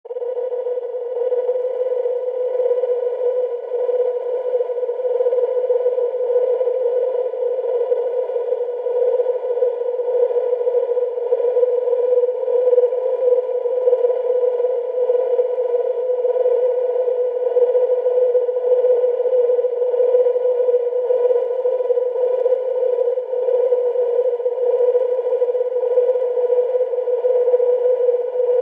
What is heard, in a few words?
science fiction alien insects crickets